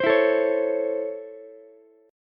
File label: Db Minor Major 7th Sharp 5th Db Ukulele; DbmM7#5 strummed; JGuitar